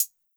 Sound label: HAT CLOSED